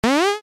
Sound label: Retro Jump